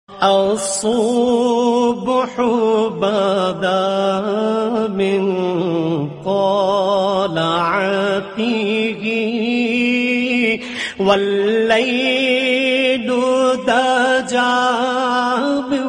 Naat Ringtone

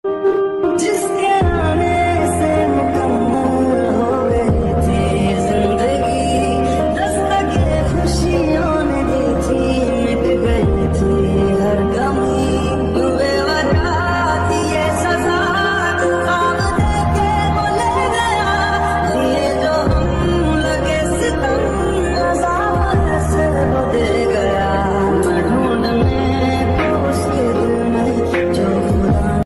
Mood Off Sad Song Ringtone; ringtones

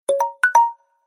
Redmi Note 8 Pro Notification Ringtone; ringtones

